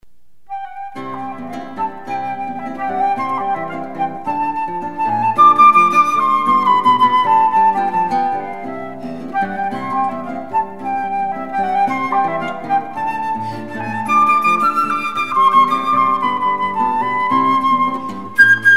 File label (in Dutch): fluit tumba